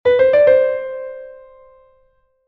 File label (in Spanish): grupeto